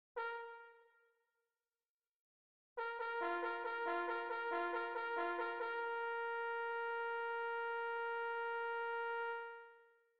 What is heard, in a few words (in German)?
Halali